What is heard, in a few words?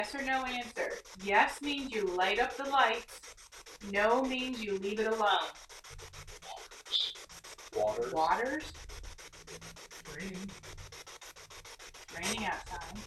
Indian Head Room Spirit Box; wexford general store antiques investigation